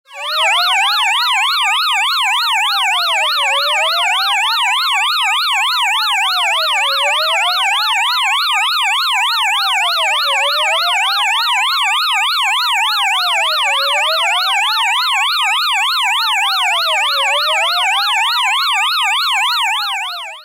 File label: Self Siren; LG SU780 Lollipop 3